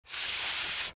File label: spy jeers; vo